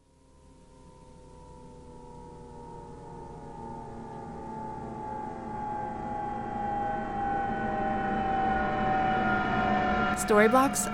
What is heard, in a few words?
rising horror screech